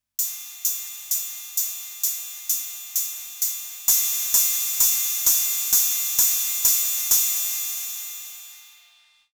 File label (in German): Cymbal ohne dann mit AC